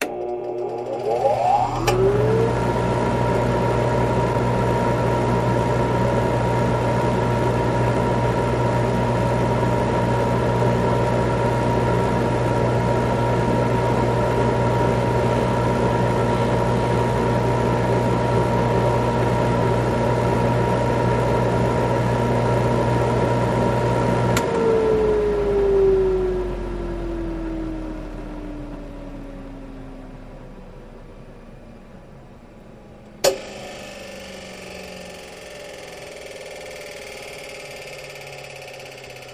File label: Machine Wind Updown, variable speed